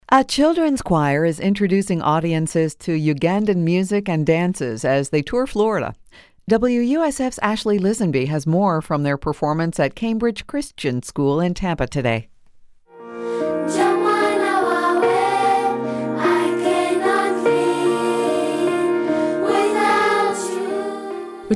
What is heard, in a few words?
ugandan choir